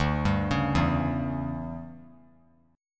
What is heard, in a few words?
D Major 7th Suspended 2nd Suspended 4th D down 3C 3F 3A 3D, chord; DM7sus2sus4; arpeggiated; JGuitar